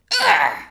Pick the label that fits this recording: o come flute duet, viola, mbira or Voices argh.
Voices argh